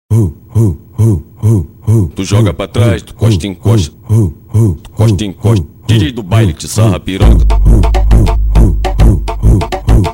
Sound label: especial funk; cd bad boy som automotivo usa